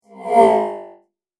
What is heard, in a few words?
Future Vroom, whoosh sound effect